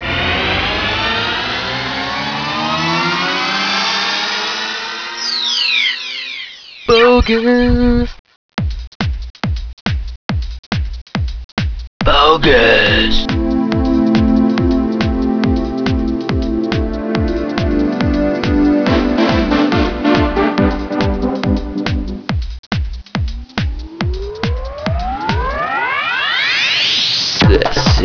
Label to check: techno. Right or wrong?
Right